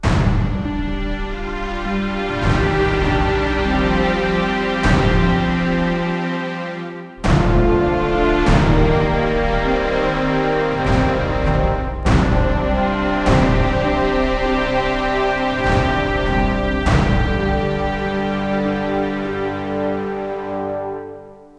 Results Lose Loop drums

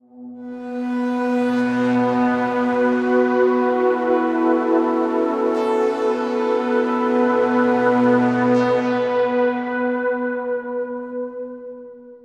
Synth B Long

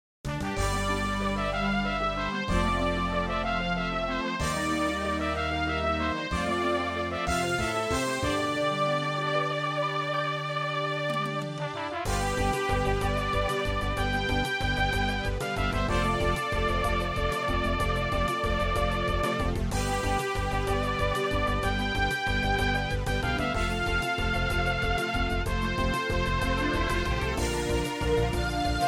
MIDI Theme

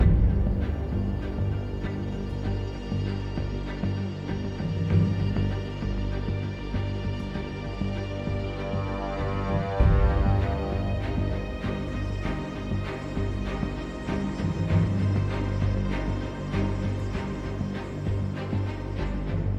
Mood Normal Heavy

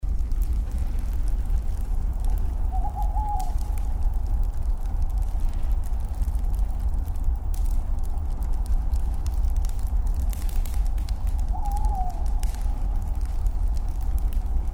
music weapon store; iDaggers; Android

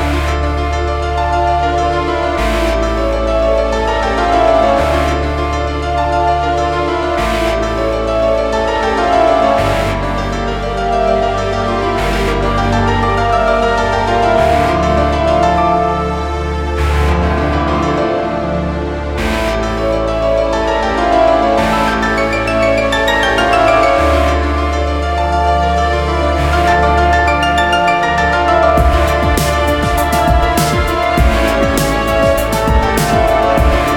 Abstract Experimental Track Looped; Dual Blade: Battle of the Female Ninja

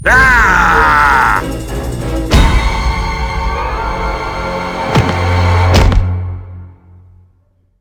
music level cut outro musik; Jack Keane 2 The Fire Within; Windows gamerip 2012

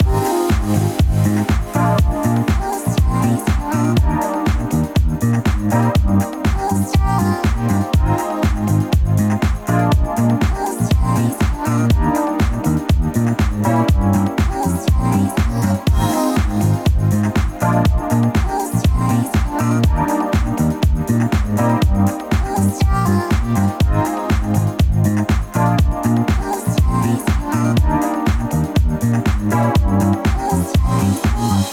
Funky Disk Loop; popslinger; ps4 ps5 switch windows xbox one xbox series xs

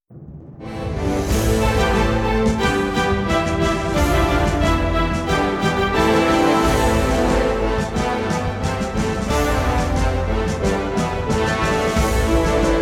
Event Success; bastard

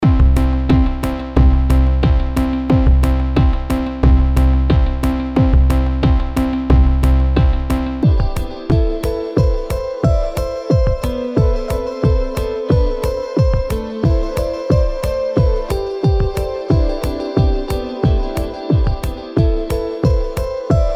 Streets; song of the myrne what lies beneath

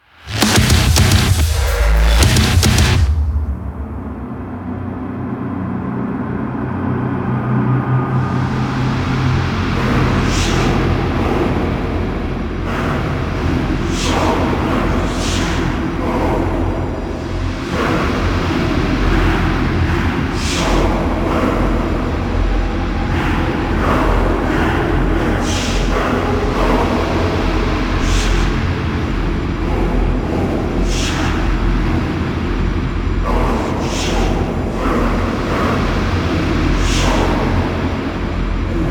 ending; Doom

